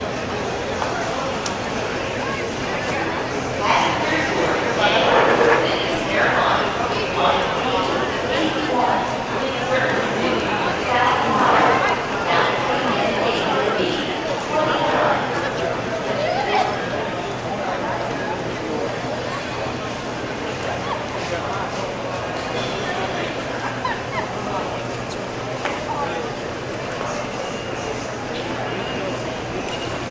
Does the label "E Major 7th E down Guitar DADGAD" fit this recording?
No